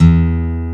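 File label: acoustic guitar E1; Proteus1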